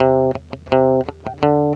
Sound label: FX02, FX guitar; USB Soundscan vol.31; Miro s Grooves; AKAI